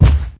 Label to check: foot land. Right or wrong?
Right